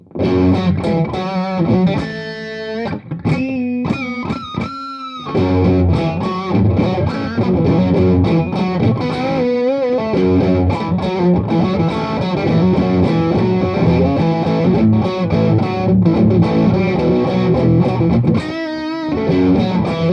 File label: truevintage fuzz, guitare